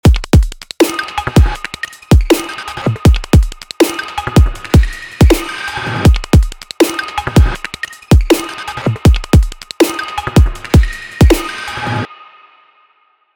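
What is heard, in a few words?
reverb work drum snare on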